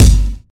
bass drum one shot g key, Royality free kick sound tuned to the G note. Loudest frequency: 832Hz